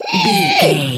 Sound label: goblin fairy attack low